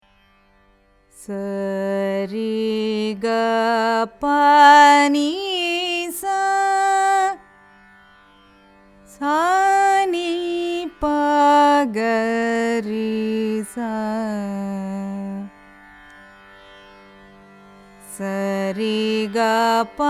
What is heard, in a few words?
raga rathipathipriya arohanam avarohanam; carnatic music